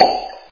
pop korn odinochnii blizkii spokoinii

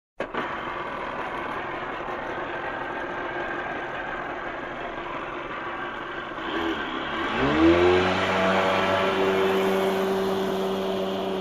gidrocikl vodnij motocikl uplivaet plavaet v daleke

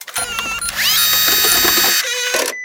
sevshaya batarejka u starogo fotoapparata

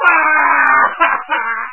plach

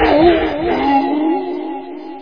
ston

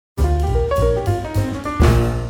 legkij i udobnij perehod zvuka subtitrov

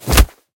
udar tochnij moshnij bokserskij

